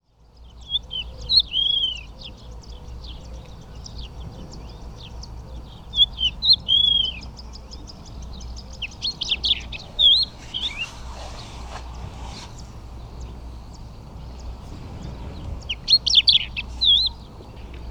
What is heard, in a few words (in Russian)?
hohlatyy zhavoronok galerida cristata, zvuki peniya ptits